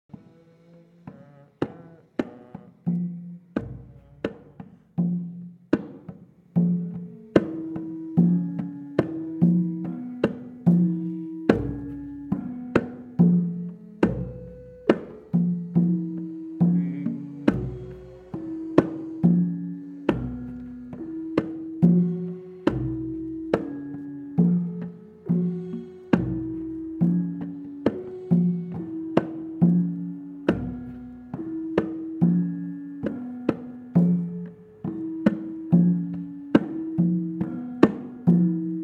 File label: pangkur example lendang